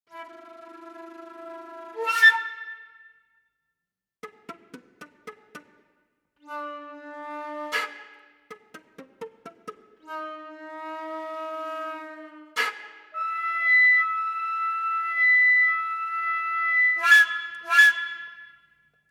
flutter, bends, jet whistle, multi-phonics, slaps and wind noise in this flute sample; sampled woodwind instruments; Berlin Woodwinds; Orchestral Tools